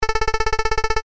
会話

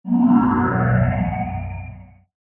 ダークマター

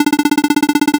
会話; nes retro talk sound effects

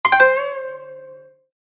場面切り替え 琴 和風

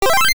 決定 レトロ; famicom system

